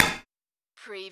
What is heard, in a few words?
Button Sound - Metal Tap